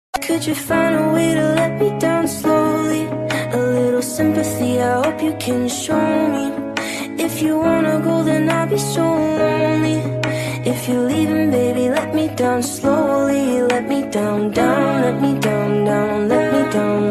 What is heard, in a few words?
Mobile Ringtone